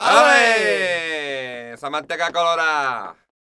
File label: Flamenco, Vocals, Male